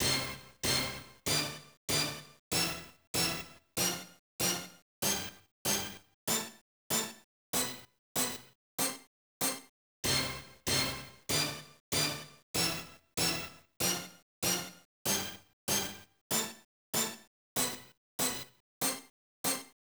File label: Sound Scale Check; TwinBee Yahho Fushigi no Kuni de Ooabare; Konami GX